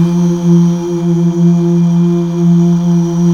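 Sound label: Male Uuh; Best Service Gigapack 1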